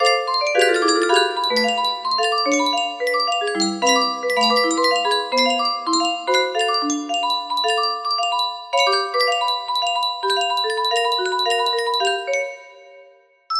music box; bendy

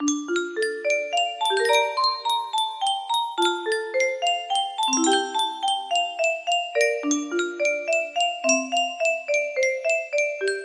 music box custom scale

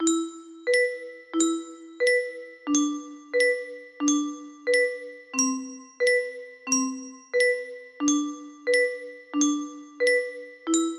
high piano, music box

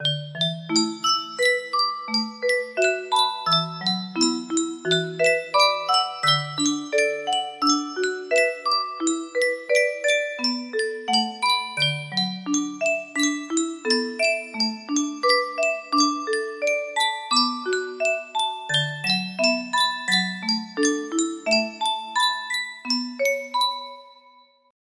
pentatonic d small fragment low note, music box

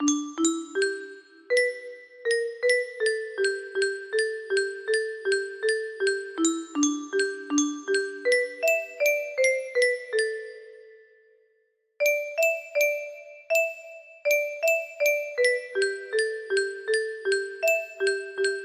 main melody, music box; jungle book

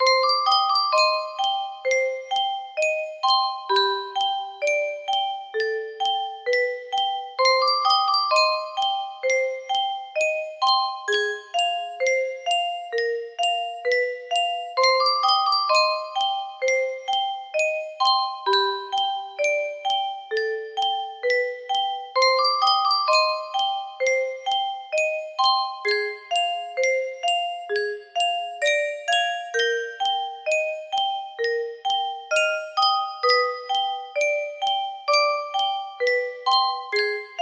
music box slowed; candyman ost

